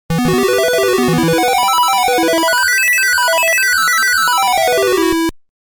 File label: harp; pegasus' harp